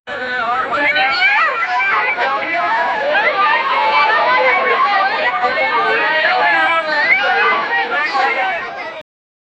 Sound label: intro pool party, party